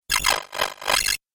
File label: Cool Fx Video Game; Twistedtracks